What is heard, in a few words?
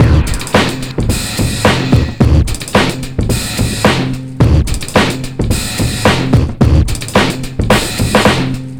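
DRUM 109, HITBREAK 109, Breakbeat; Best Service ProSamples vol.24; AKAI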